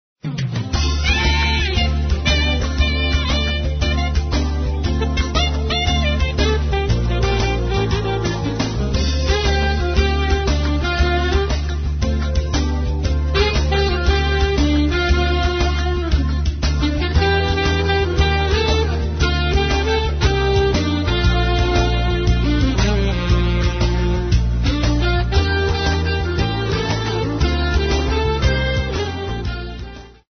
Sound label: jazz pop